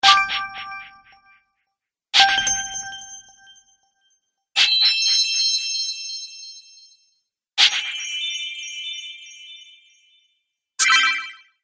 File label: Coin Magic Collection, magic sound effects